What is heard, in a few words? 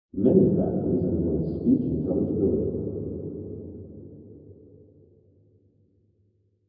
muddy sound